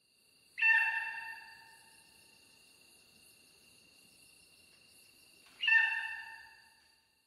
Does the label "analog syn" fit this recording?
No